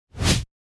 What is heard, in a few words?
Woosh Short Quick